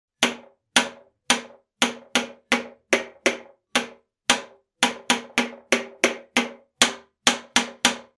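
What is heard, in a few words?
plastic sound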